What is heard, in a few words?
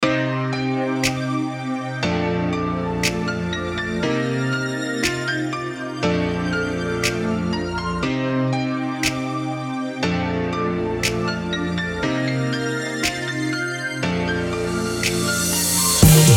new rnb